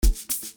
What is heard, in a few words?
Psychedelic